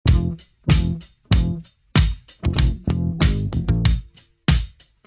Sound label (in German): Groove langsam, Bass-Groove langsam